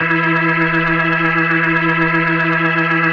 E3F, B3, Vintage Keyboards

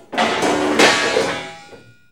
PAN DROP, Kitchen Counter; E-MU Producer Series Vol. 2; More Studio Essentials

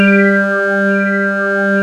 SWEEP WAVE

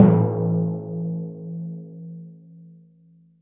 timp; musicradar; cartoon caper

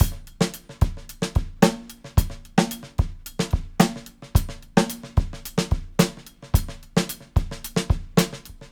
Live Beats, R&B Drums

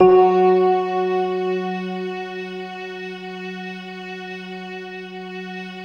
Fender Violin, Fender Pad; Optical Media International; Sonic Images Library

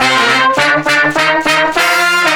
Horn Sect Effect; Sonic Foundry (Sony Creative Software); Jazz Solos and Sections; Effected

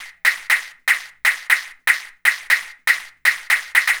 Percussion, Trance NRG, Drums

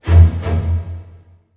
STRINGACCENT, Orchestral Loops

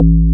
DX BASS; E-MU Formula 4000 Series Vol. 2

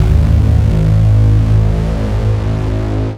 Bass FX

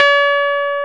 KEY MKS20 E, MKS20 + EP; Roland L-CD701; Pop Pianos 3

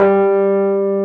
AMB ROAD, Keyboards Old School; USB Soundscan vol.09; AKAI

